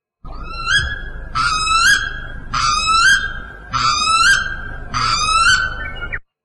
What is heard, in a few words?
weka